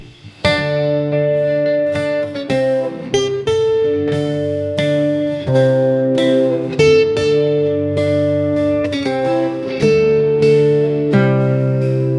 guitar solo in post punk; alternative

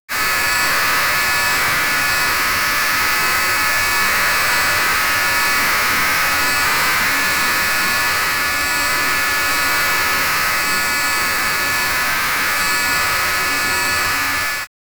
the sound of grinding in, spaceship

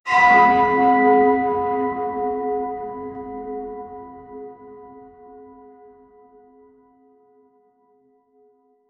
church bell horror hit ec

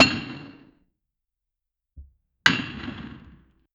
brick thud heavy stack brick

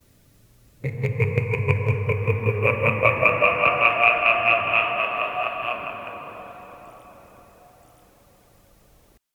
generate a cinematic horr, hallway

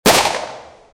ak47 single fire shoot

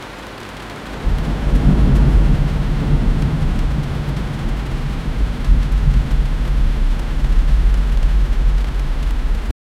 a heavy rain with thunder

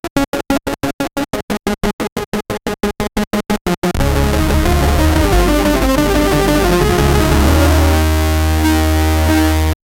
victory sound, chiptune

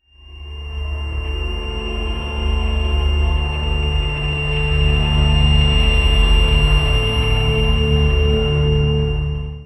bright triumphant audio, magical shimmer

